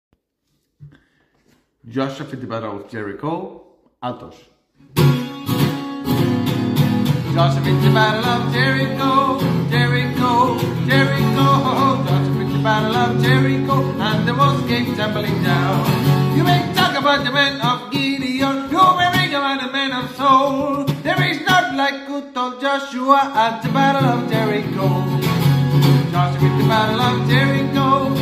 josua fit the battle of jerico bajos

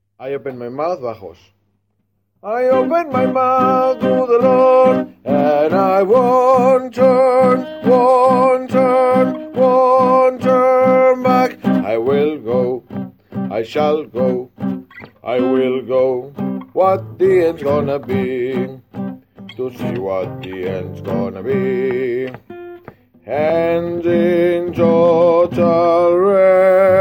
I open my mouth voz baja

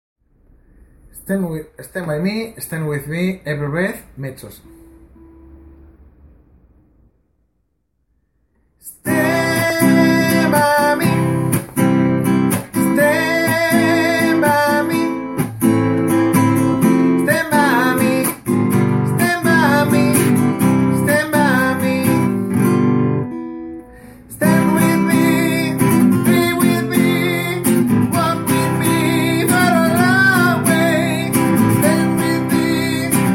voz mezzo

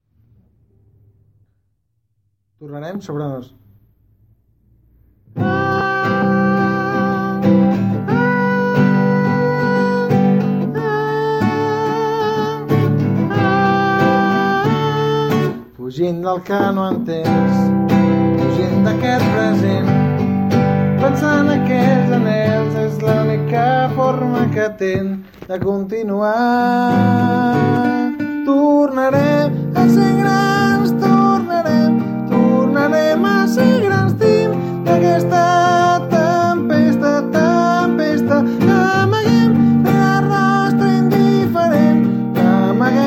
voz soprano; tornarem

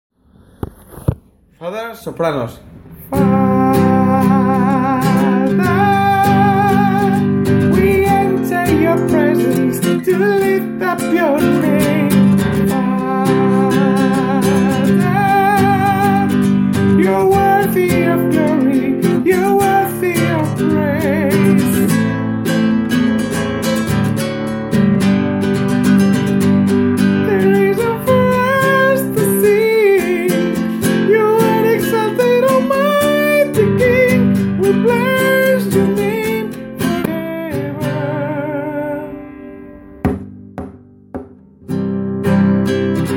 father voz soprano

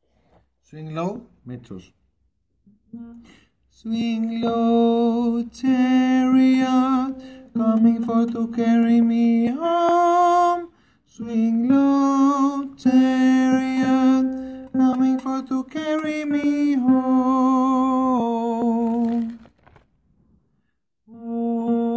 parte2 mezzo

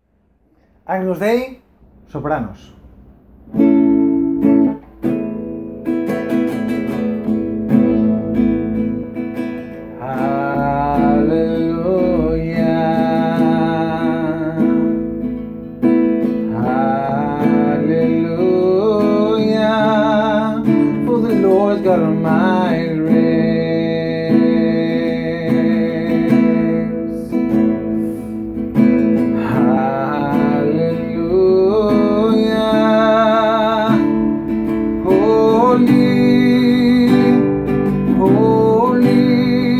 agnus dei voz soprano

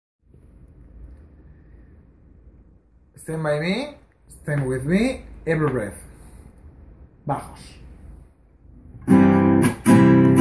voz baja

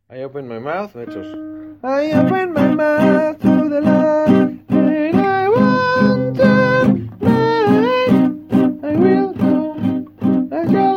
voz mezzo; I open my mouth

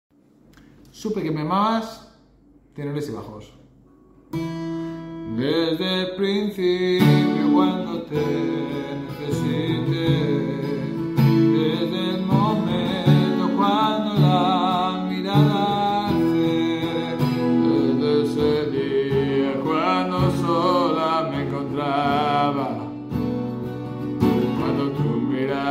tenores y bajos

